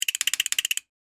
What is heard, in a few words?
Steampunk Robot, Scifi, Steampunk, Robot, Mechanical, Spider; Science, Fiction; BlastWave